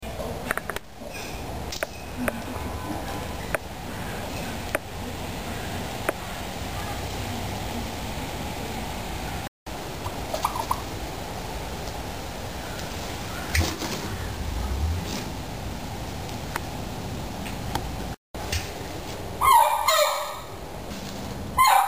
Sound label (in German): truthahn laute